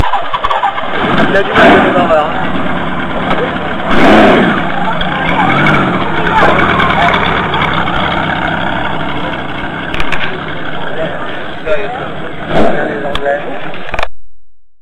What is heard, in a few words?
run V8